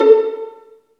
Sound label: Vlns Pizz; Roland L-CD702